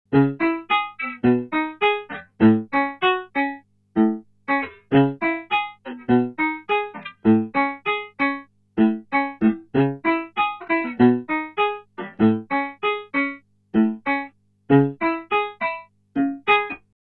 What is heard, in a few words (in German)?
EHX Pico Attack Decay Poly Attack ganz zu